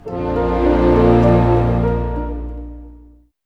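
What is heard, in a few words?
orchestra doom; East West; Scoring Tools; Doom's Day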